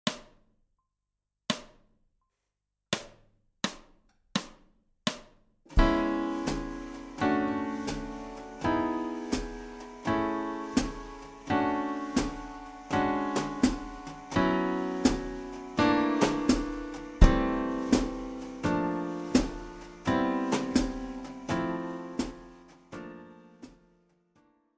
changing in dur

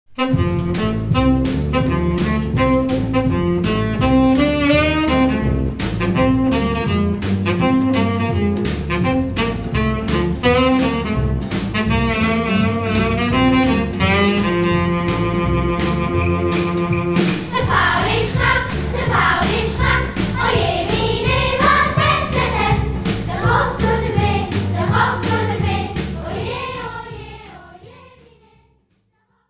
Rock n Roll

